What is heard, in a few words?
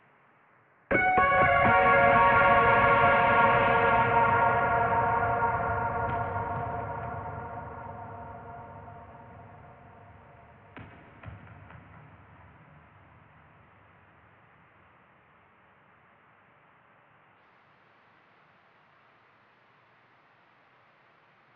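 Note Theme 128bpm, D Minor, For Exploration; Voidbreaker; ps5 windows xbox series xs; Lima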